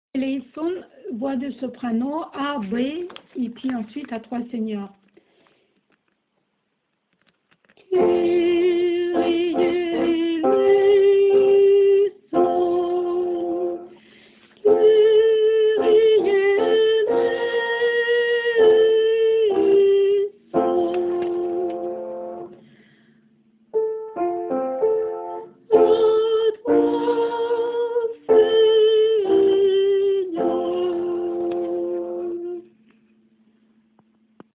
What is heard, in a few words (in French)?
Soprano; Ectenie1